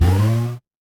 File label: retro game power up